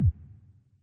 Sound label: BANG